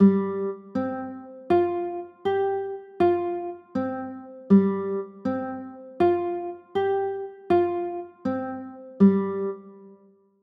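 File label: G sus4 chord, guitar; g 7sus4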